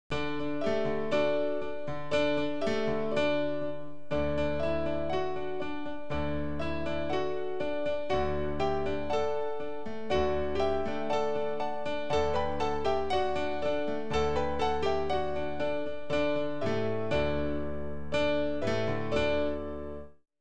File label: Greeting Song ins